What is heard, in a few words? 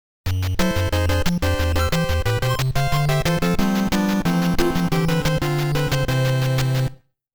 Stage Fail; Game Sound Museum Famicom; Excitebike; VS. Excitebike